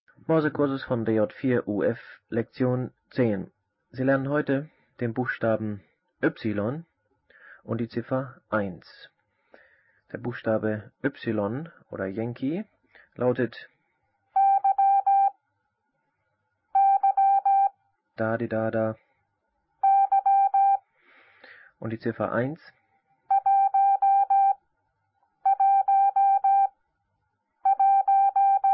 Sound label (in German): Morsen; Onlinelehrgang